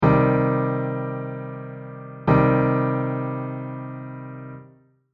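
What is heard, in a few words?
Guitar triad C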